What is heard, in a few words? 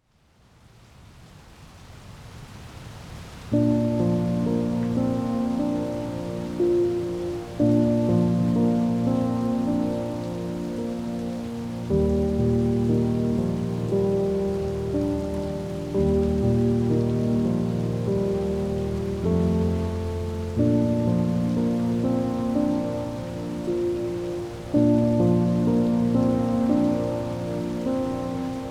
piano, deep sleep baby rain music